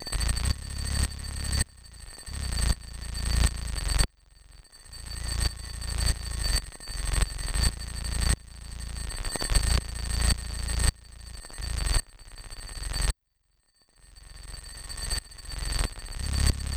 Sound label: Glitch Bells; polygoniix Intro Essentials pt2; Electronic; Glitches